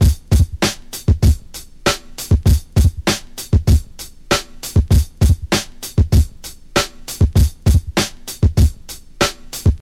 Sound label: 98 bpm breakbeat C# key, Free drum loop sample - kick tuned to the C# note